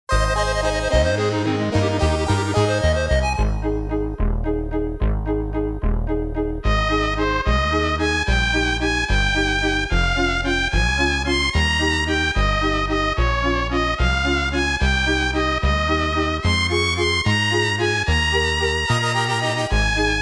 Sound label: Waltz; dolucky's sandlot baseball